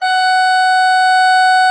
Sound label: F5, Cathedral Organ, HOLZPRINZ.; Propeller Island